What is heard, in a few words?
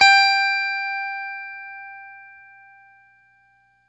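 Stratocaster, Guitar; Quantum Leap; 90's Sample CDs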